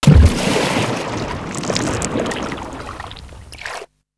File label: Mine; ASUS; P4B533; Intel 845E ATX